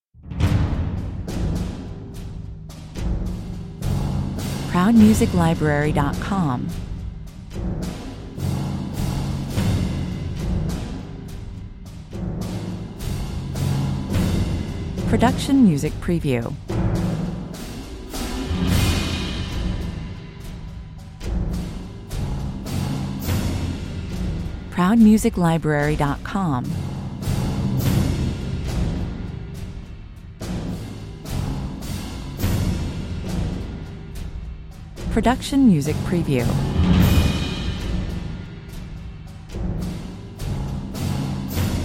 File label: percussion only